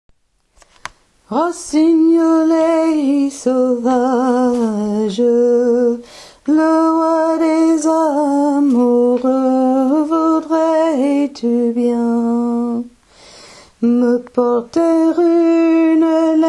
hommes; rossignolet

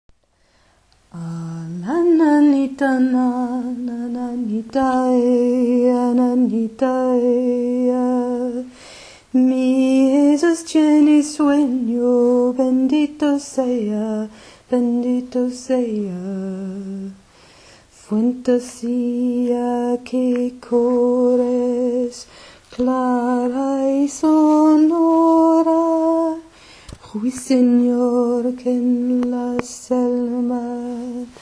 tenor chanté bas voix d'ours; a la nanita

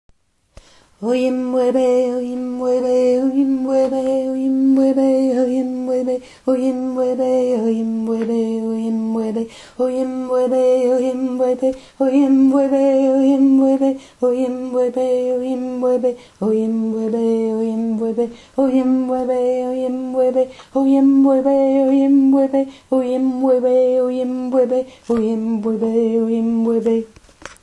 partie 2 tenor; uyimbube

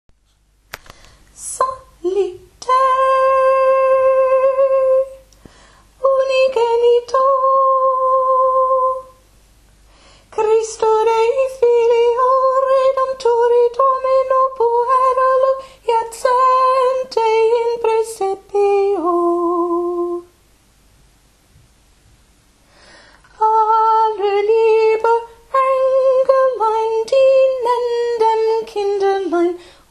voix tenor psallite chant entier

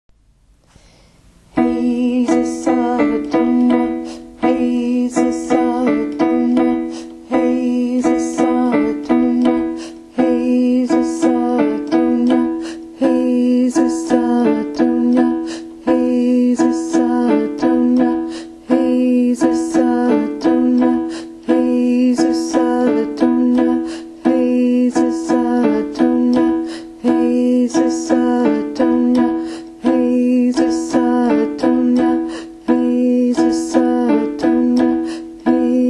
voix tenor

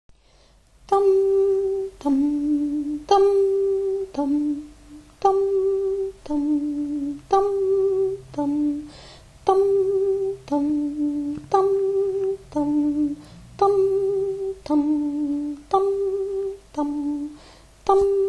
voix basse les anges dans nos campagnes

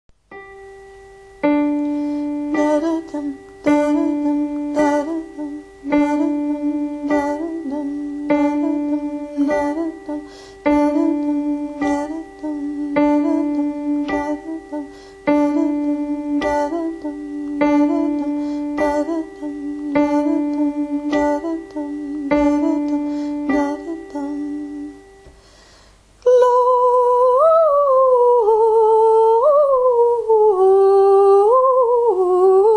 voix tenor

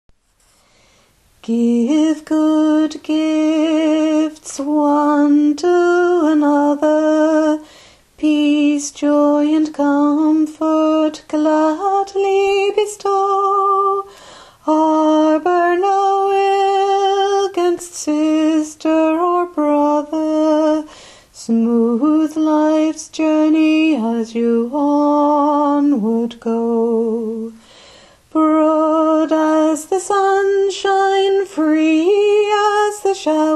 alto voix give good gifts

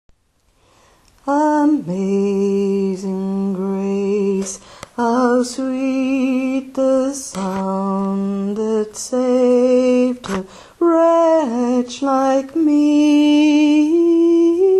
voix basse

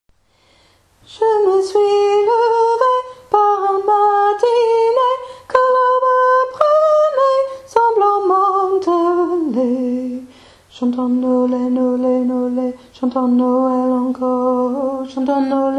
voix haute; chantons nolet

